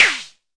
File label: Arturia MiniBrute sn